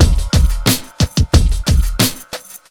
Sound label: 90 BEAT, Pure Electro; USB Soundscan vol.03; AKAI